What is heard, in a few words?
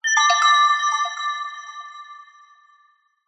sound design twinkle mysterious warm musical; zapsplat